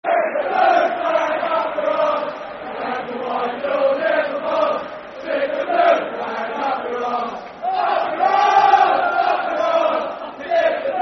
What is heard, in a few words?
Stick The Blue Flag, Football Chant (Soccer Songs), Fulham